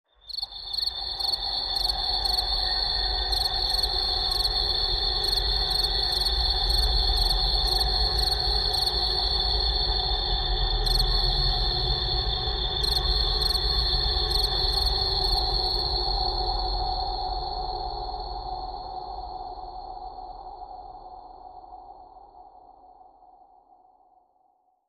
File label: Placid Lake Crickets Atmos